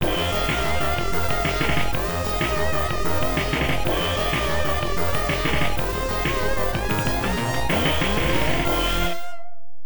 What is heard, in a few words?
Tea Break (Round Clear